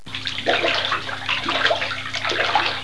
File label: SPLASH